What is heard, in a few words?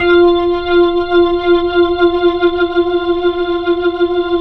HAMMOND 3F R; 3D Sound; 3D Keys VOL-1